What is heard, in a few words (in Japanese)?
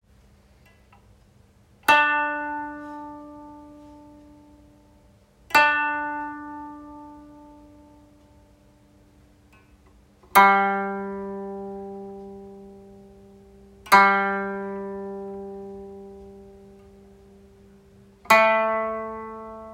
調絃音源 九はC, 壱越の平調子から九を一音上げる; D G A A# D D# G A C D D# G A; 地唄箏曲美緒野会の楽譜で弾く場合はこのようにお調絃してください。